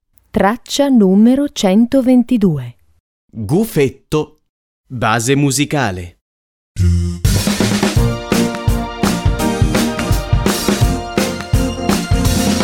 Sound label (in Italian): base musicale; scuola al centro; infanzia